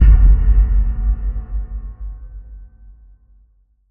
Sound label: Explosion